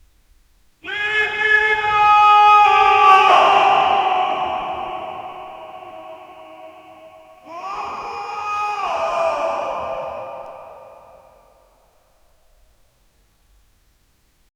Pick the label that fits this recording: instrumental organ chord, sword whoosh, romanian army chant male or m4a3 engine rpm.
romanian army chant male